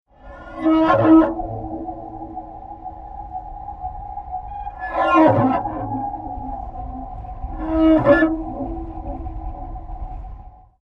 harp dyn archet

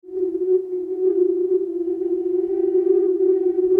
orgue bambou medium

